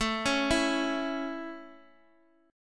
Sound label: A Major A; arpeggiated